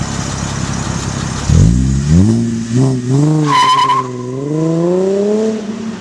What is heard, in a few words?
Camaro Taking Off